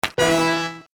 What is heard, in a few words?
BTN Win; Sushi Suprise